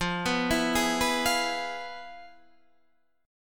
F Minor Major 7th Flat 5th Guitar Open D

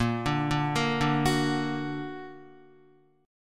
A sharp Augmented Guitar; A#+ arpeggiated; JGuitar

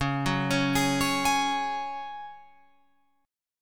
Db Suspended 4th, Guitar, Open F; Dbsus4 arpeggiated; 13,13,13,15,16,15

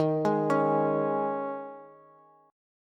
D sharp 7th Suspended 4th, Bass; D#7sus4 arpeggiated; JGuitar

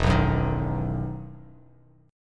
E Major 7th Suspended 2nd E down Bass Standard; EM7sus2 strummed

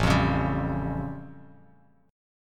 A Augmented 7th A Bass Standard; strummed; JGuitar

